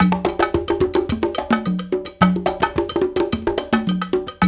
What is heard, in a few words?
World Dance; Spectrasonics - Supreme Beats; Compasa